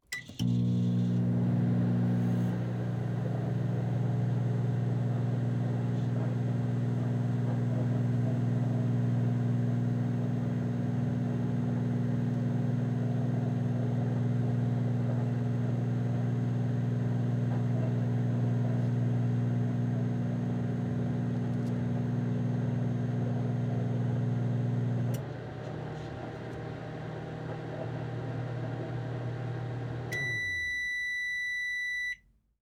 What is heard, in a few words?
microwave oven, machine sound effects